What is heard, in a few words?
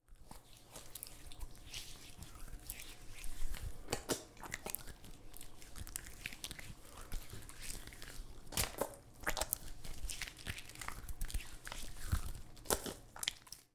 Human Male Soap Up Hands